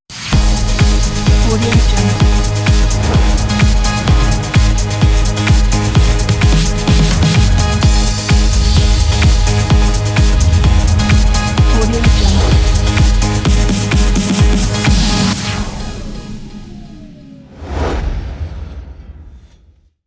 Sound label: fashion logo